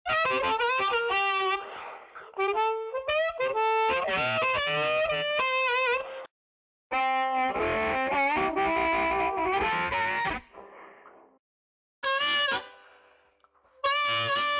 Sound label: solo